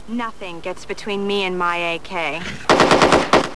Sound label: ak-47 very small